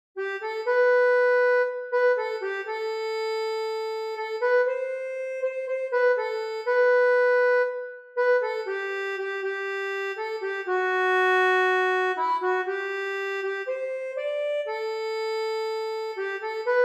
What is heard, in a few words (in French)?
ACCORDEON